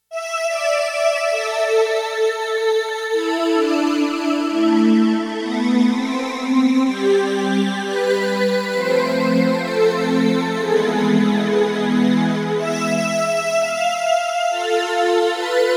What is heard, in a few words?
SYN VOX, Roland JX-1; Factory presets